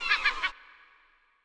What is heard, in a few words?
Icy Bird; ImpGal2; colony